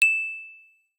Twinkle; LG Swift L9

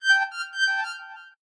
priority notification; Razer Phone

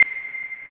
ui beep, Motorola ROKR EM35; ui sounds

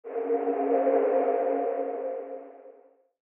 haptic lock weather cloudy; Sharp; AQUOS sense lite SH-M05

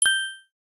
doremi sharp; SKY IM-A800S-VEGA-LTE; keytone

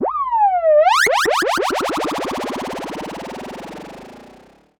fx; Google; NewWave Labs

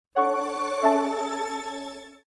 sms; ZTE; Majesty

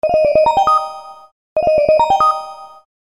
Ringtone, Samsung GT-C3110

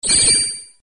Notifier Ninja; BlackBerry OS-5

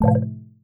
Undock; Nextbit; Robin

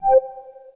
whitehole lock, phonetones, LG; LG Wine Smart F480K

